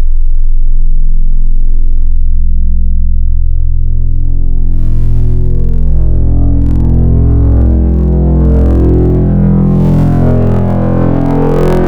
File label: FM, Wavetables